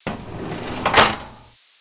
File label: File Cab